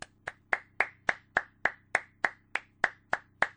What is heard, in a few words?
clapping; roblox